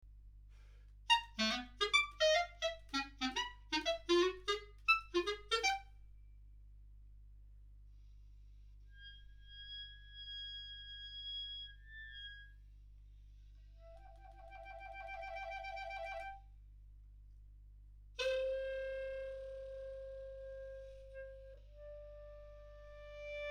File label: piccolo, clarinet